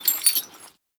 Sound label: Putdown Shackle; Warframe; Cephalon Weave